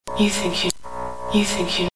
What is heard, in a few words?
sms ringtone